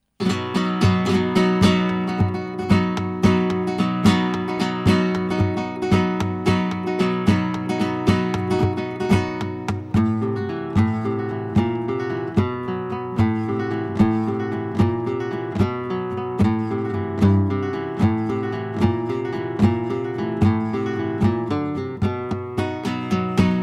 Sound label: buleria